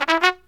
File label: TRUMP, Killer Brass Riffs